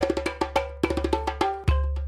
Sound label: djembe sms